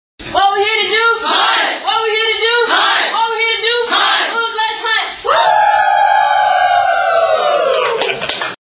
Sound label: timberwolves do the chant